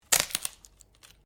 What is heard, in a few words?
Branches Dead Snap